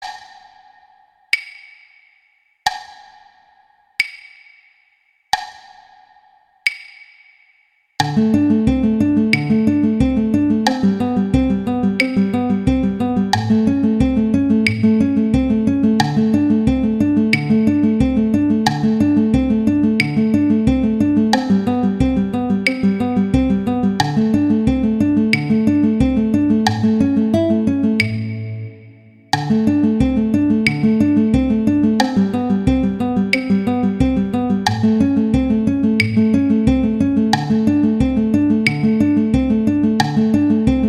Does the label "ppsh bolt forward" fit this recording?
No